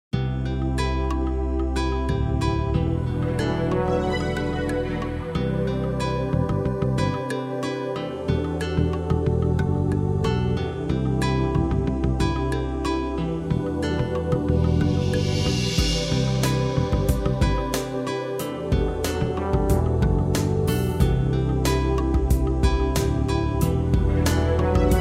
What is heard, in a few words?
Easy Listening & Classical; Sound Ideas